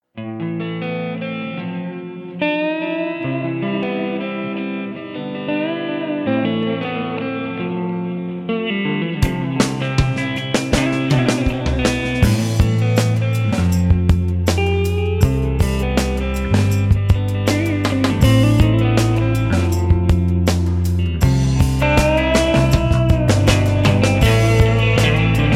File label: background pads